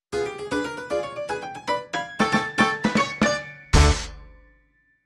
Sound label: Dixie Long Sting